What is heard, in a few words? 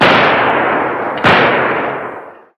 Ambience Gun Shot